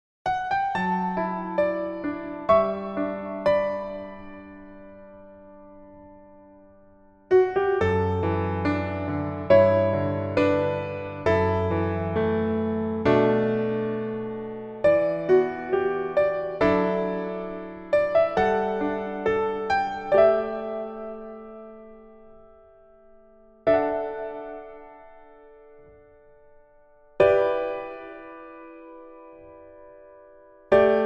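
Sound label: Piano version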